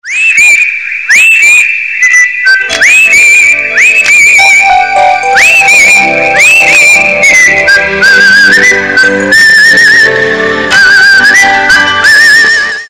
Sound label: Soot